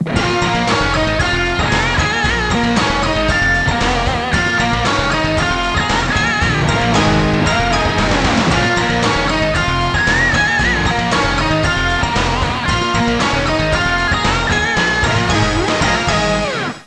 suonerie musica rock

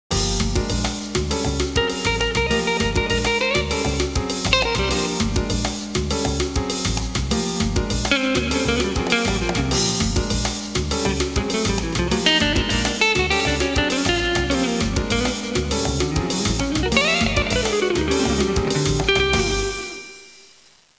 musica latina

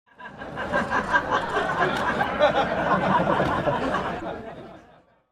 suonerie persone risate

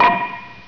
Conficca Palo, cartoons

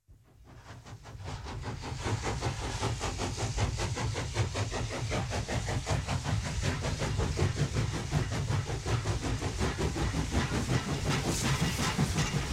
TRENO A VAPORE PASSAGGIO; trasporti; treni

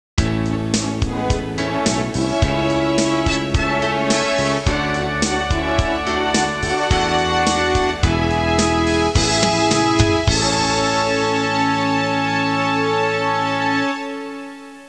suonerie contemporanea; musica contemporary

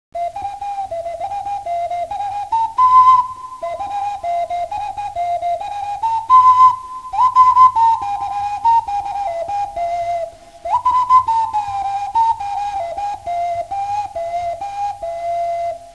musica strumentale

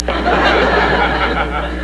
suonerie persone risate

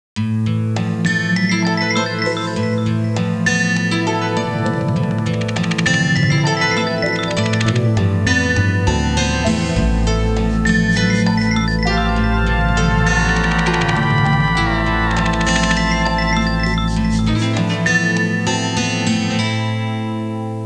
musica elettronica

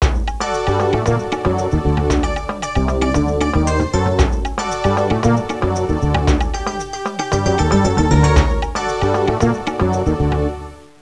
musica traditional est; fareast

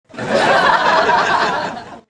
applauso, applausi, persone

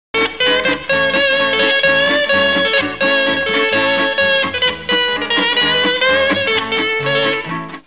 musica strumentale

